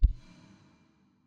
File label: Desert SFX, Crystal Magic Burst